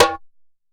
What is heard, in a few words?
SABARO HITS; Best Service; World Colours